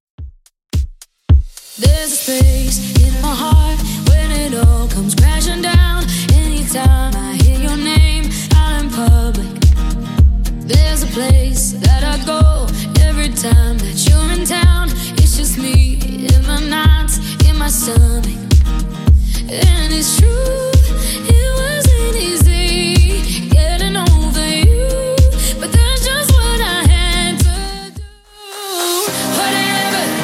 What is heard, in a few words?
Top40 Redrum 108bpm